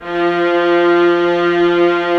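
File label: viola legato; Multisamples; Digital Sound Factory Virtuoso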